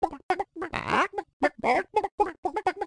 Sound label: walk; lego my style preschool